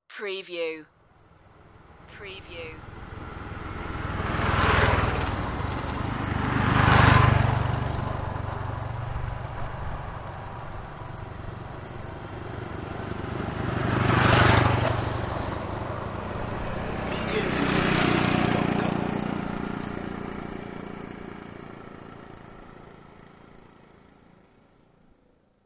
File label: rattly cars and motorbikes